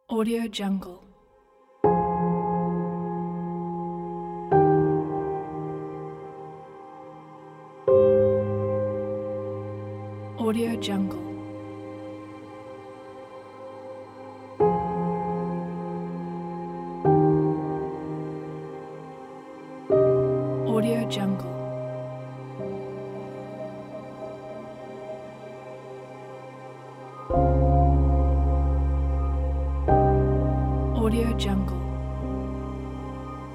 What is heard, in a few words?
piano sad